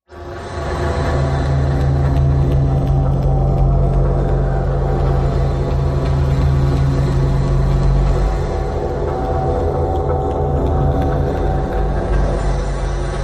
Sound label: Persist, Machine, Fast Ambient Space Factory, Tapping, Airy